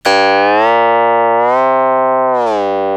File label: Synthesized Guqin Tone Without Body w Body Model Filter